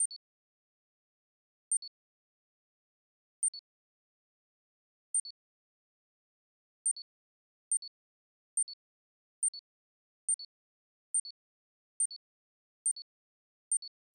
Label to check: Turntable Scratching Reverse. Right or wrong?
Wrong